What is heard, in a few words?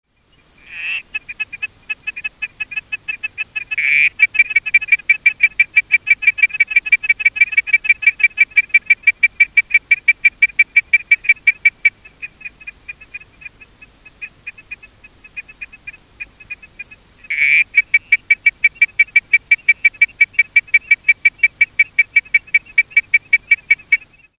victoriana, frogs, geocrinia